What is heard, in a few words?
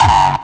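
hard bass drums; Bazzdrumz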